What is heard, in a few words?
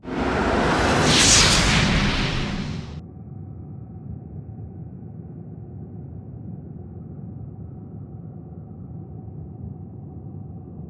charge; RYL 2 v1673